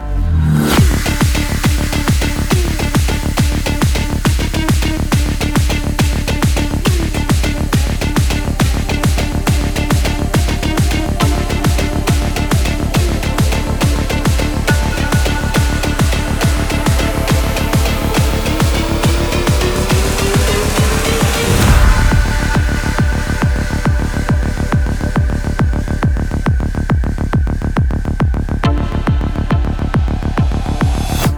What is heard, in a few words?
Rave; Barotrauma; DynamicEuropa; Red Alert